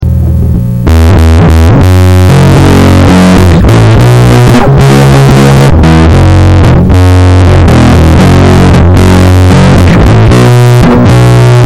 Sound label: volume low eq all up, amp from a old personal cassette player; private amp from a old personal cassette player